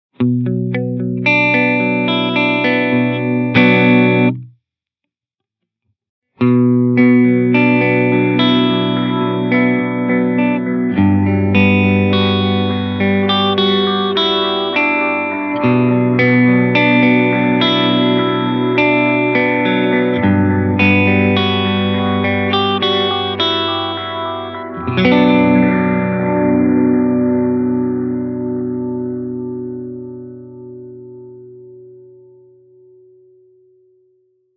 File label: Analog BBD